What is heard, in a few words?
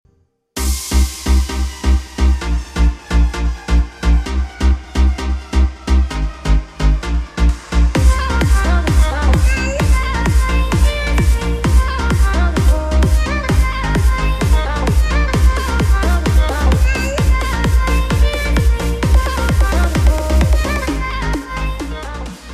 Instrumental Ringtone; Sacred Games